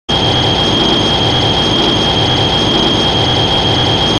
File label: beam